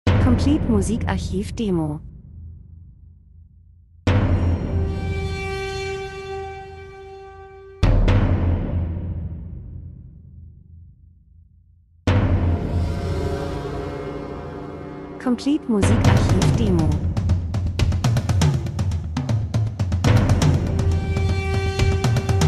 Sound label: drums; Spannung, Krimi, Angst, Triller, Maschine, Industrie, Film, TV, Hihat Bassdrum Becken Pauken